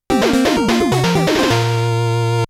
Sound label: Gameover